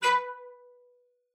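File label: CELLO spiccato B4; Xsample VOL-5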